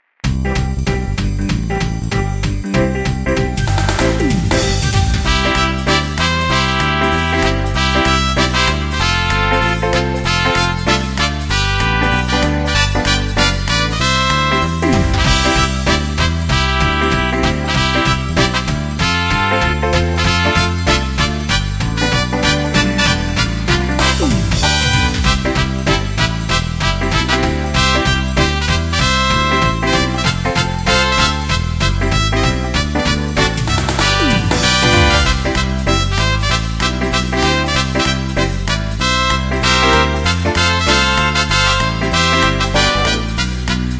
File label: Jingle Bells; Christmas